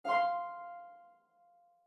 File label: hi flowers hit; Slack; macos